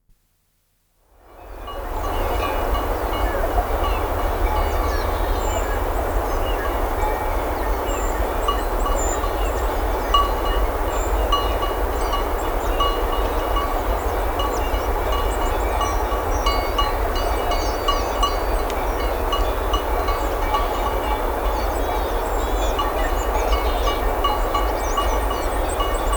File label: Sheep Grazing On a Mountainside; Dr. Sound Effects; 210 Nature Sounds - 20 Hours of Relaxing Natural Ambiences for Meditation and Sleep